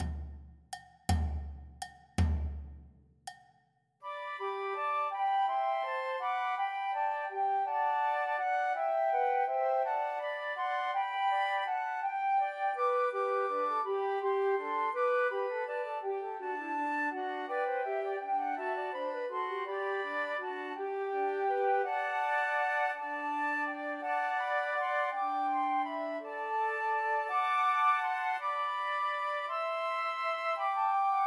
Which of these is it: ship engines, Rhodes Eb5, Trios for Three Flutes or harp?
Trios for Three Flutes